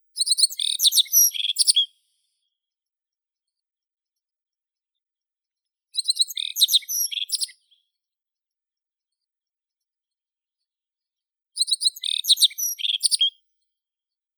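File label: black-faced bunting, Emberiza spodocephala; ovsyanka sedogolovaya; zvuki prirodi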